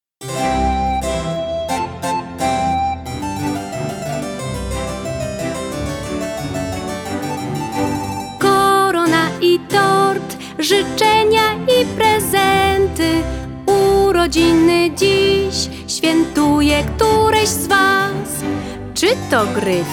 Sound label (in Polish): Piosenka